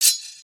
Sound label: Thwok slash; Summoner